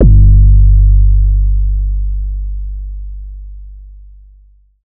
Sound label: 808 one shot G; Slate Digital BASSHOLE Sample Pack